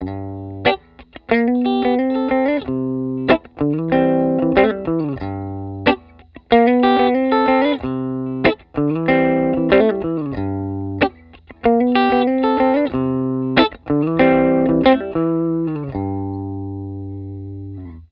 Both pickups together through a 1950 Fender Deluxe, humbucker, lick; patent denied humbucker pickup